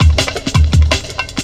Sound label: Drum Loops, Jungle